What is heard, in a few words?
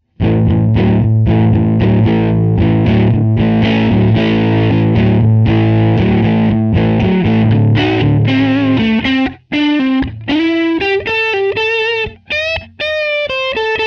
crunch; hamer front